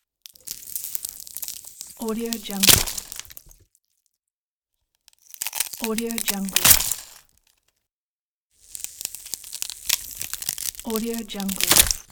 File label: ice breaking